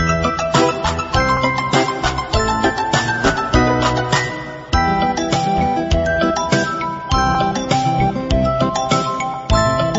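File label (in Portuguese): músicas de flauta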